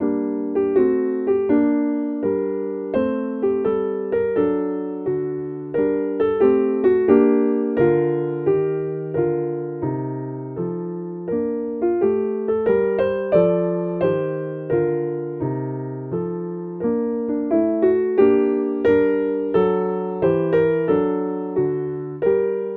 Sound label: Bechstein close